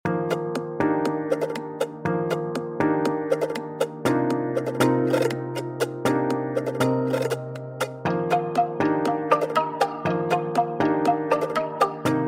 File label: chords arps; ADSR; Segue; Cthulhu